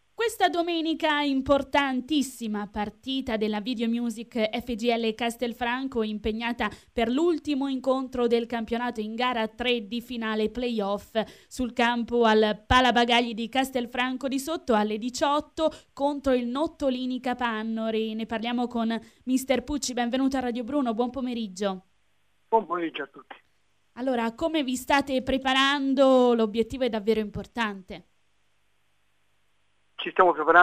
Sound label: videomusic